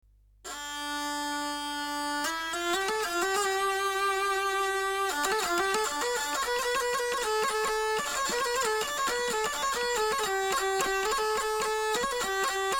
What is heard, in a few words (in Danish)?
drejelire; eastwind